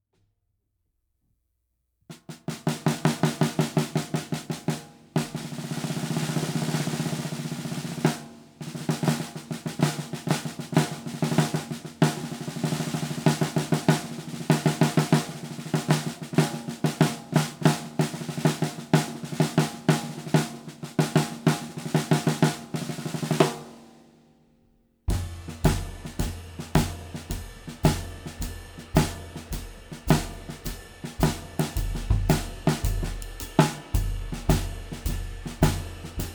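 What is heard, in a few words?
Craviotto Baritone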